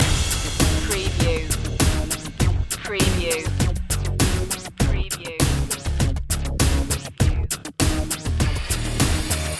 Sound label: deep impacter loop